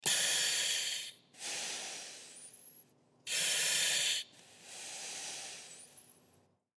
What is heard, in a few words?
Fire Oxygen Mask, Fire Oxygen Mask Breathing Slow; Emergency; Emergency Vehicle Sound Effects